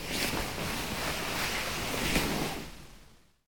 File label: tent nylonish sound; butterflysoup2